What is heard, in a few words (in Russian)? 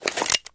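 короткий звук перезаряда винтовки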